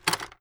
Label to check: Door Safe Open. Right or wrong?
Right